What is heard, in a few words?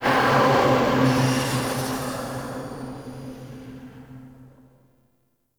Loot Room Open Vocal; Warframe; Ambience; Entrati; Dead End Two